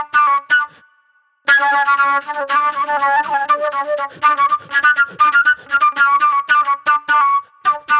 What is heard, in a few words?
Fast Funk Flute